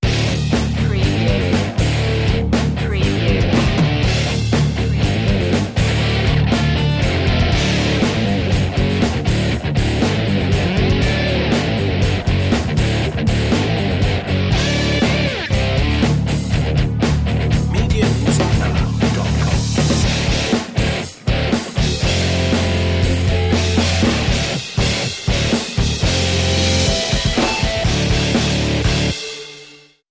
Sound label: metal